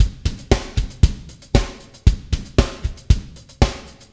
116bpm 8beats; LoopsBitsSamples

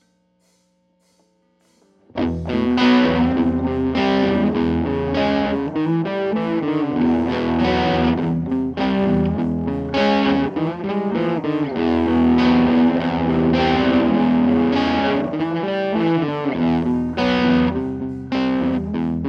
Guitars

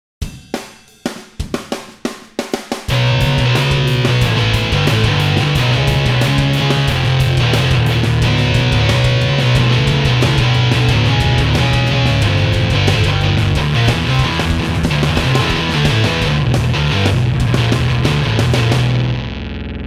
bass

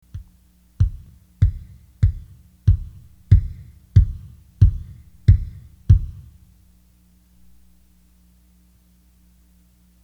Thunk

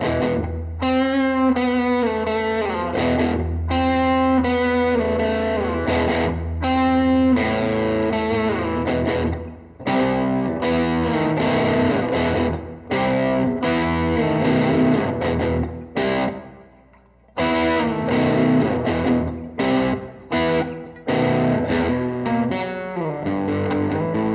Riff

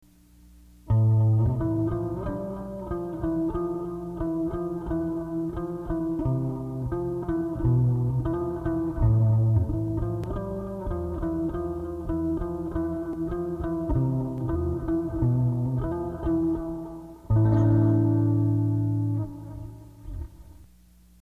Bass Solo

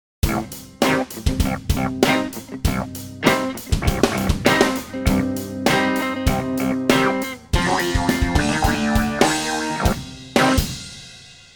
riff

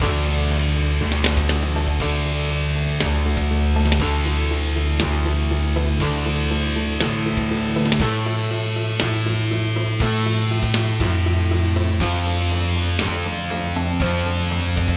Generative Boomp drums

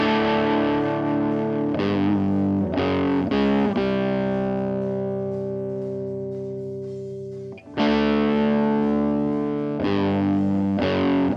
Guitars

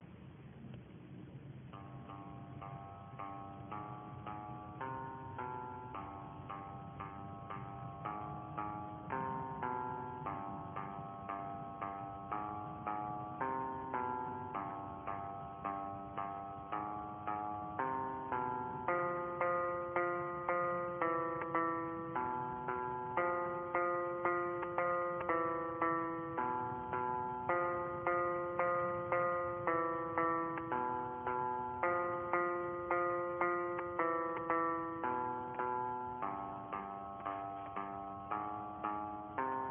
Bass No Brakes; Jeff

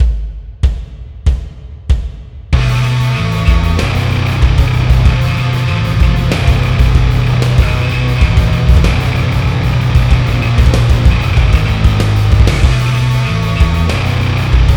Riff

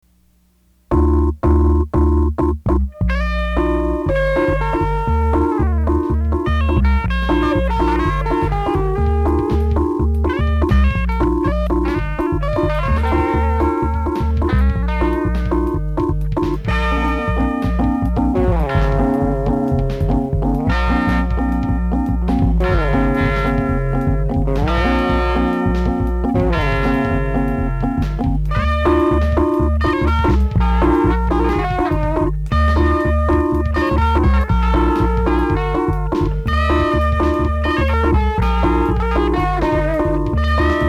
Dit dit with guitars

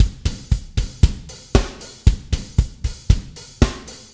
116bpm 8beats; LoopsBitsSamples

